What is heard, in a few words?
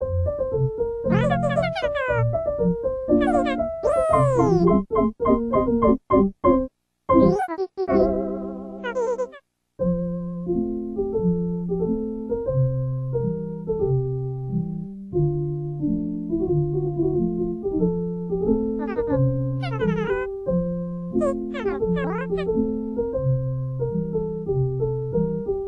Aerobics; Sports Fair